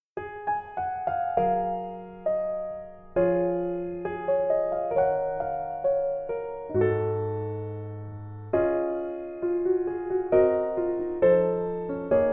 Piano ver, 멜로디MR, -1키

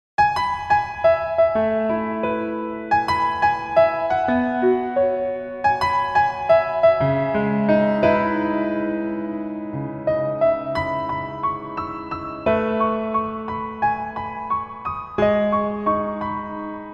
Piano ver 멜로디MR -1키

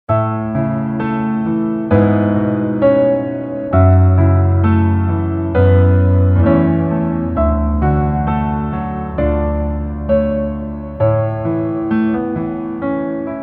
Piano ver 멜로디MR -1키

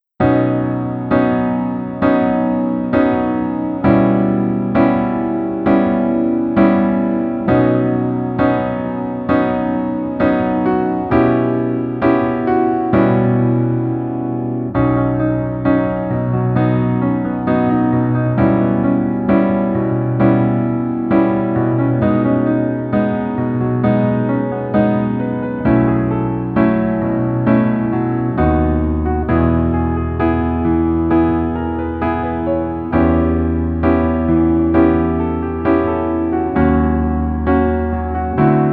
Piano ver, 멜로디MR, -1키

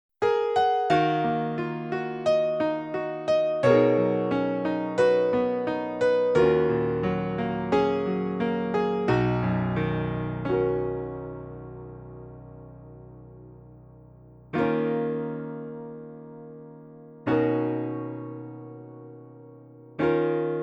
Piano -1키

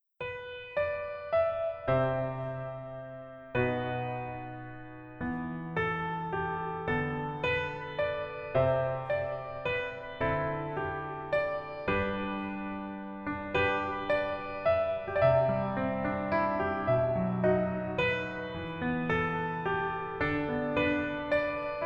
Piano ver 멜로디 MR

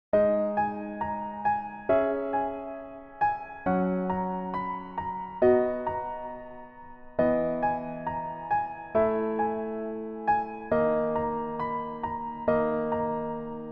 Piano ver -1키; 복면가왕

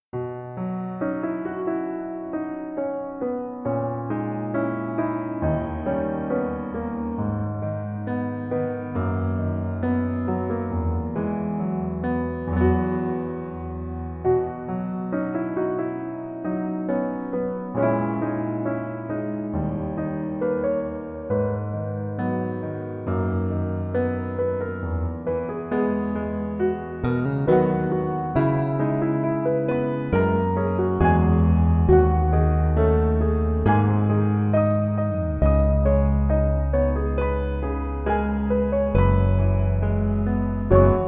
Piano ver 멜로디MR -1키

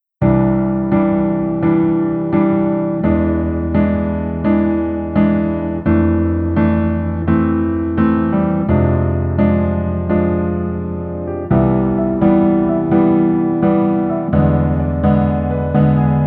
Piano ver 멜로디 MR 남자 키

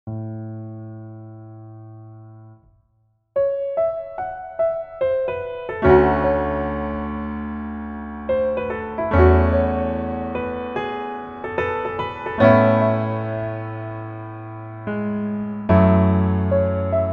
Piano ver 멜로디MR -1키

